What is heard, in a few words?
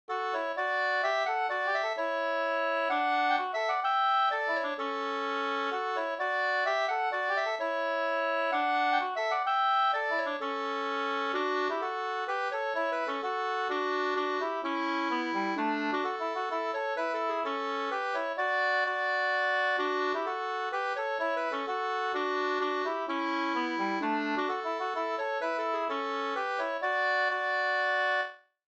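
polska, two-part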